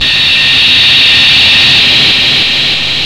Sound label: DISTO FX; Big Beat Samples & More; AKAI; DISTOFX KIT